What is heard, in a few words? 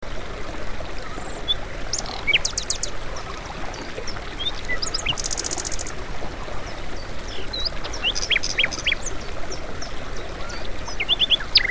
Birds and Water